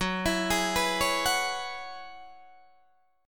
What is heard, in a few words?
Gb 9th Suspended 4th Gb down Guitar DADGAD, chord